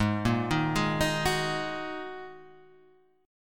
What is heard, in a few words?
Ab 7th Suspended 2nd, down, Guitar, Half Step Down